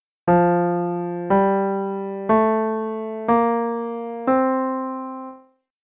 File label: UP Bass F maj